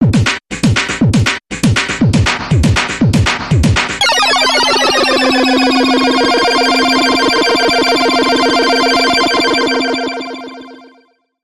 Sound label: Computation Space Bumper Reversed